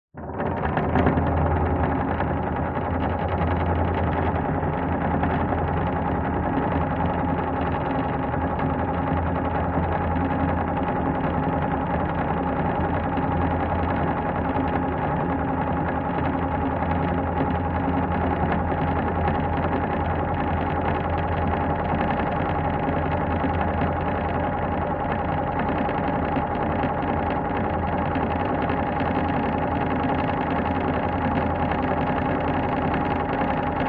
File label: industrial machine motorised whir cable lift wooden on operate off; zapsplat